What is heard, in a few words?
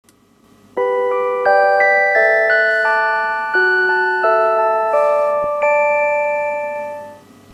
serenade electronic